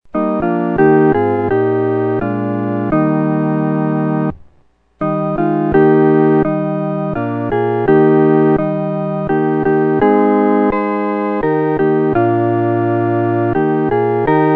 合奏（四声部）

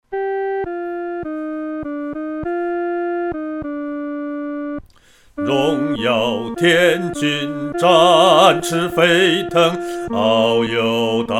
独唱（第二声）; 荣耀天军

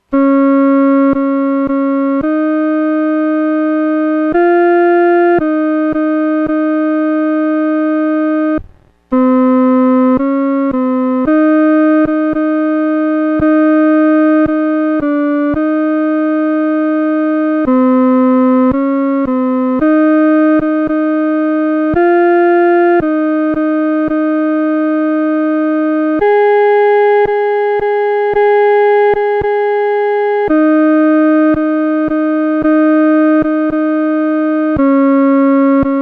独奏 第二声

